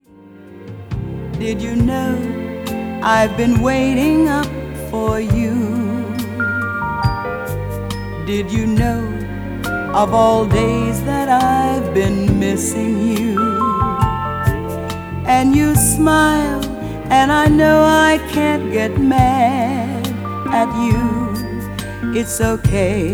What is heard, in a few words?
jazz Vocal Lady